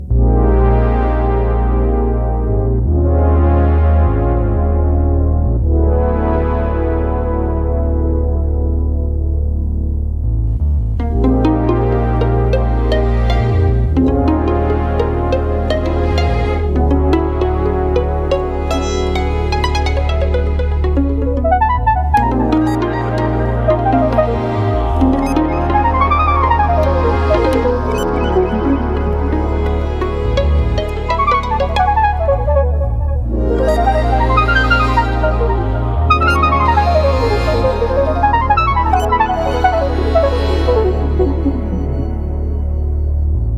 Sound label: strings version; Breaks